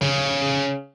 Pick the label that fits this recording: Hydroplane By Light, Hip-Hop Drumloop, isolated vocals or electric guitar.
electric guitar